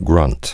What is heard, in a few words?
grunt